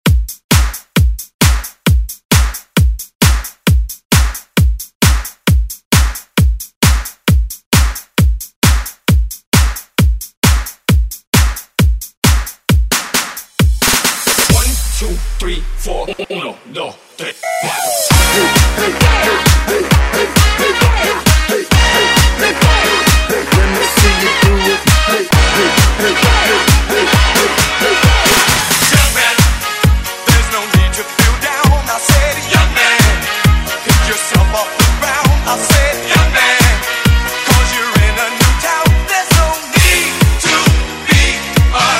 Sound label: Party Hype Redrum; Deejay Hit